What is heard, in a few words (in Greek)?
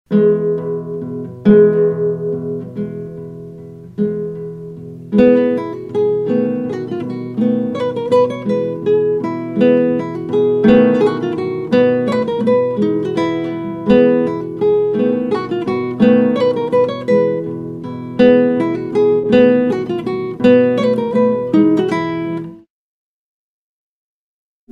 Κιθάρα Graciosa